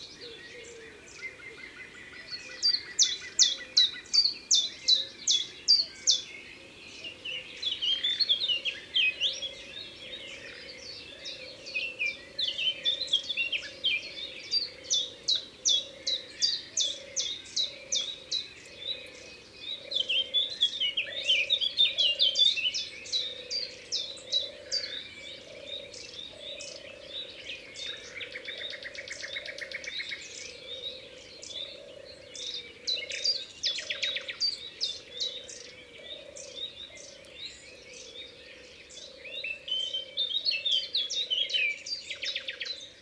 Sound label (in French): Pic Vert; Ardeche; Concerts Naturels De l'Ardeche